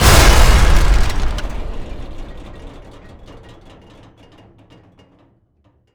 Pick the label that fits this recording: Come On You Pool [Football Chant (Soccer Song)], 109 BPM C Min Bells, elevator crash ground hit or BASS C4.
elevator crash ground hit